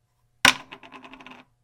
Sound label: Dropping Plastic Lid